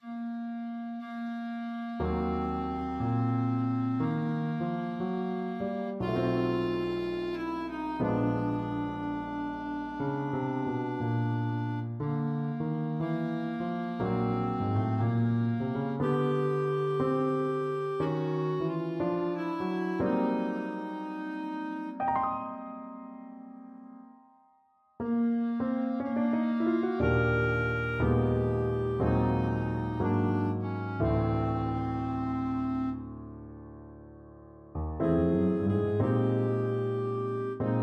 happy jazzy birthday, clarinet

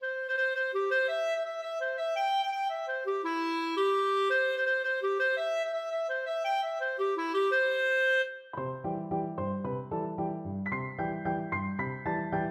carmen chorus of children clarinet

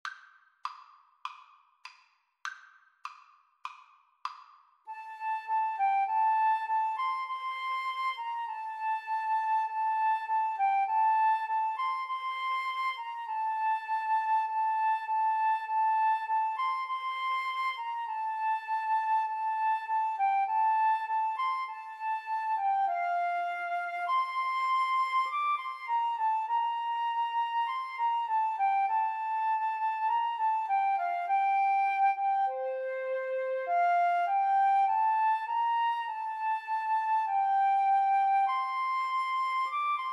Flute Duet

